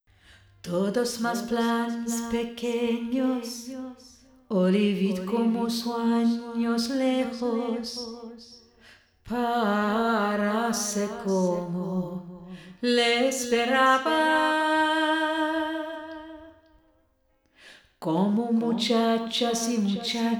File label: Lead Vocal w Reverb